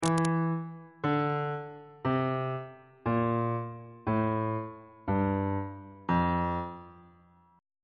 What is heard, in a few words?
e sharp ionian mode descending on bass clef